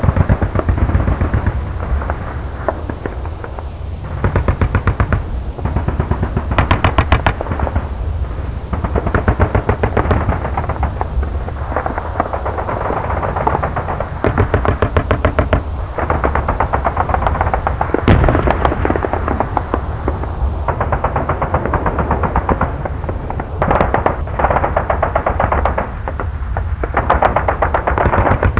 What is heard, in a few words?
bombing; svencoop; pyramide